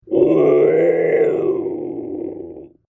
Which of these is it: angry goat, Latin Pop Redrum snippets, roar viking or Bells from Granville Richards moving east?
roar viking